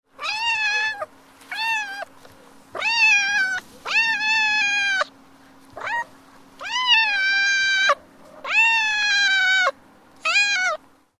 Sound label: Cat meowing loudly